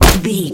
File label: kick hard impact